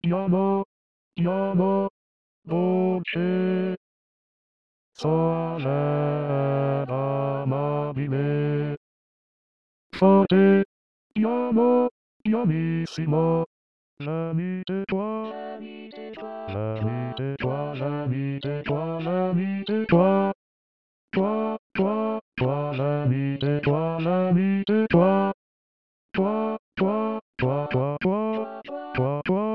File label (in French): basse